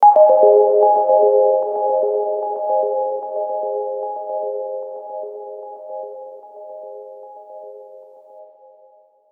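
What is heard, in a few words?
Down Arp Synth G Maj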